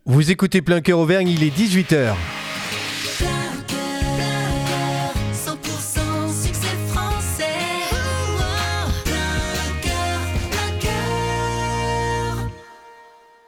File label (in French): TOP HORAIRE NEUTRE; PLEIN COEUR; MOULINS